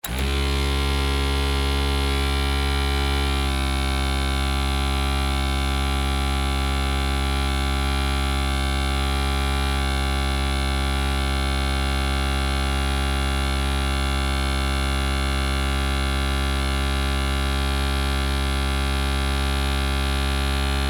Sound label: Foot Massager; Miscellaneous Electronic; Electronics; Blast Wave